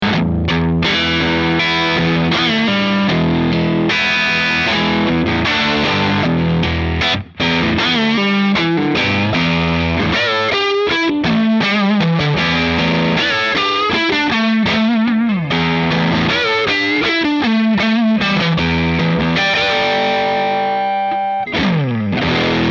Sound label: AC30HW2 Top Boost, amp samples